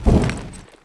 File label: metal vent, footsteps; player